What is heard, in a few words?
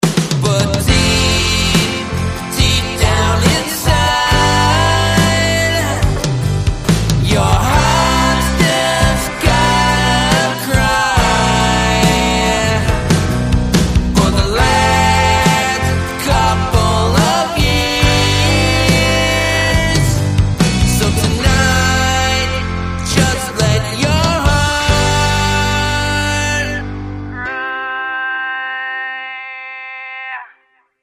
With Vocals